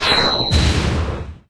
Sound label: Destruct